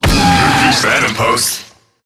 Infested Lich Pain, Barks; Warframe

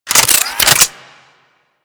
Freeze Ray Reload; Warframe; Weapons; Corpus

